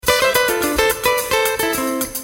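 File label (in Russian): гитарный проигрыш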